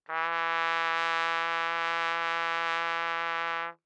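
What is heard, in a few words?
Trumpet vibrato ff E3 stereo, Bb Trumpet; MIS Pitches - 2014; Brass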